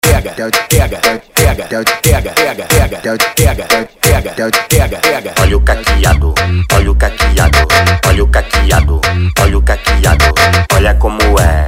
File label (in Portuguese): LOOP PEGA VS CAQUEADO